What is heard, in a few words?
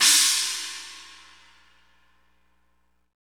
cymbal, Roland; Northstar; Drumscapes Roland; Cymbals 2